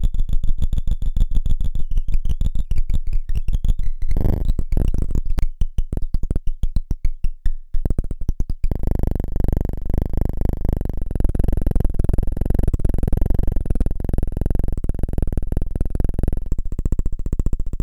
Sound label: Fractal granular Synthesis with L-systems